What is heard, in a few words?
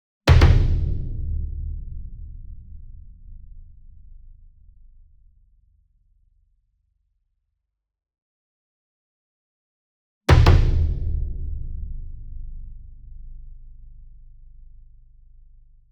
SINGLE DRUM Bass Drum Concert 40 Inch Double Hard Beater Near; boom library; Cinematic Strikes Construction Kit